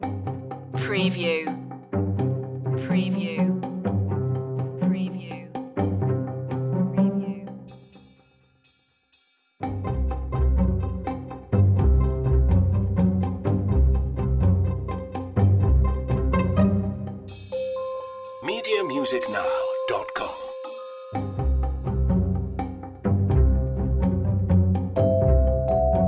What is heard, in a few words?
no melody